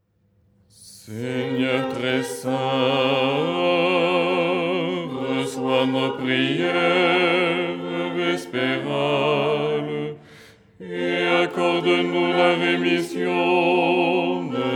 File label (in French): basse